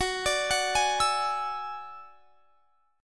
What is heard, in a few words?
F sharp 7th Suspended 2nd Sharp 5th; arpeggiated; F#7sus2#5; x,21,24,23,21,24